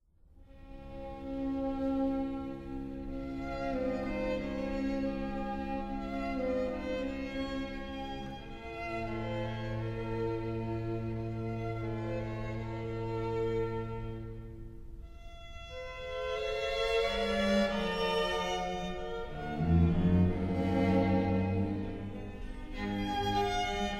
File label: Quartet in D Major